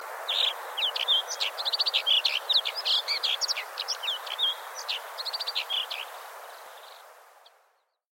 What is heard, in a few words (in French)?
accenteur alpin